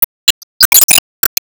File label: Return Shot